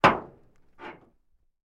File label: Metal Impacts Light, pipes